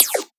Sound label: perc; Anthemic.Electro; drum hits